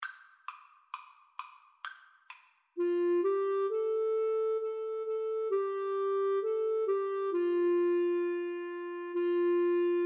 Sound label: Clarinet Flute Duet